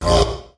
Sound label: voice fog horn; single hits